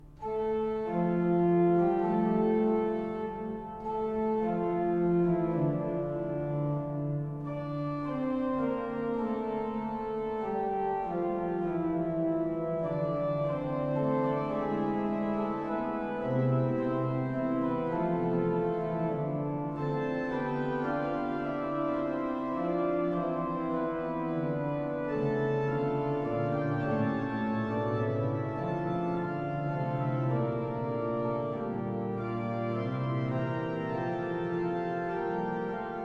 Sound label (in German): Sonate d moll Fuga Sostenuto e legato